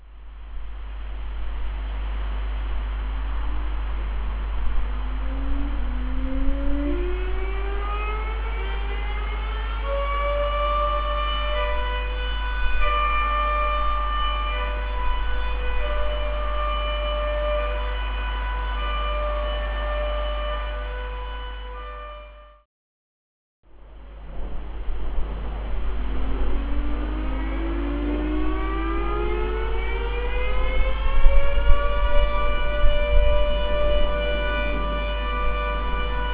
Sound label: siren